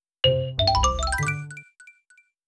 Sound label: Jingle Great Response